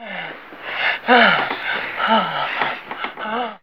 SEXVOX; X-Static Goldmine 1

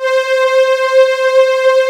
STR WAVE; AKAI S6000 CD-ROM - Volume 1; SAMPLE WAVE MIXING 1

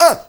MALE SOLO, MALE NOISE, Heart of Africa

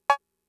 Cowbell; Fricke MFB-522; COWBELLS

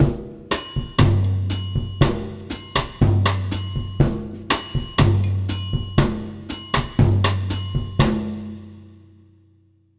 120, Brazil Bossa; USB Soundscan; AKAI

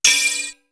METL KETL; East West; Global Sounds

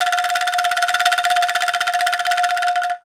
ANGKLUNGS; Voice Crystal - Steve Reid Definitive Percussion

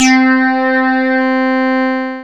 HI POLY, SYNTH FUNK; Zero-G - Funky Elements

